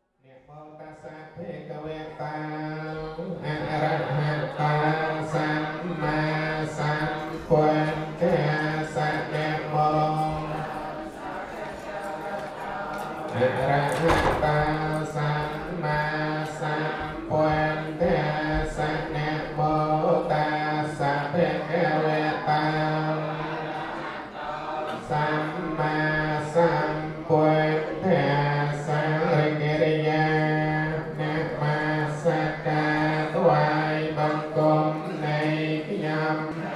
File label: Khmer Buddhist Temple Chanting; Soryarangsey Khmer Buddhist Temple